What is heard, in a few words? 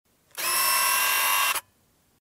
zoom